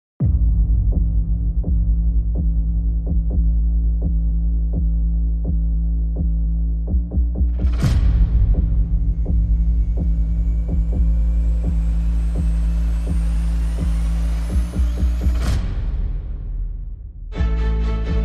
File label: Bass Strings and SFX Only